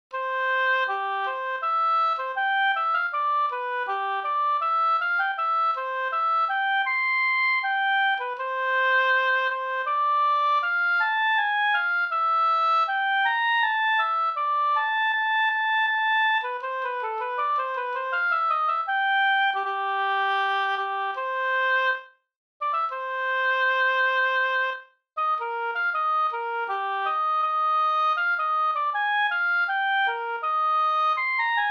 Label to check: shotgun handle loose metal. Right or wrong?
Wrong